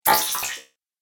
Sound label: Droid Wet, robot